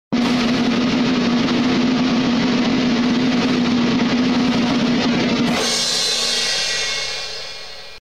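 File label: DRUM ROLL with CYMBAL; Morning show elements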